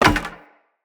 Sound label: Player Torch Drop; Weapons; Blunt